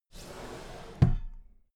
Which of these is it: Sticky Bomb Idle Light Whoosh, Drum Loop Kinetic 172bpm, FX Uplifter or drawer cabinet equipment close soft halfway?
drawer cabinet equipment close soft halfway